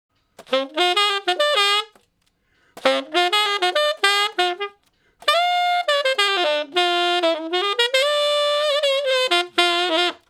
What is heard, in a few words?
Ten Sax Straight (F), R&B; Crimson Blue and Fabulous Horncraft 4 RnB; Solos